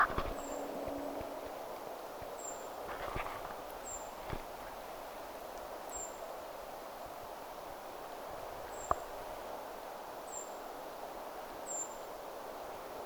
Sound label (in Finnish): ti-puukiipija